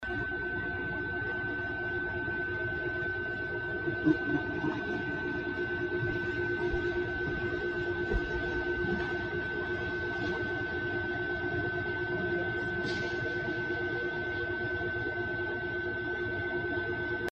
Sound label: creepy laugh w footsteps